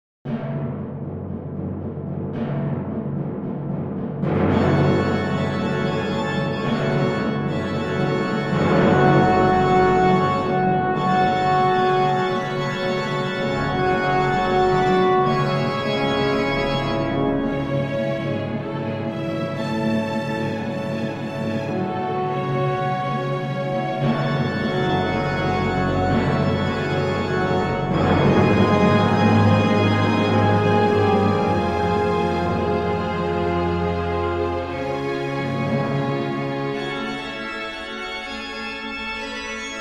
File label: organ concerto finale start